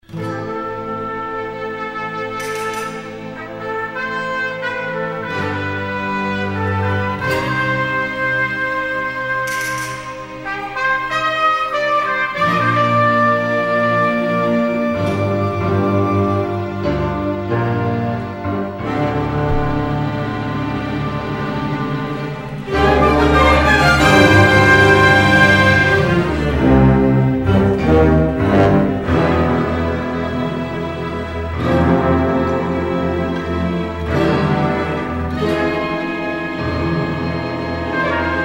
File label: orch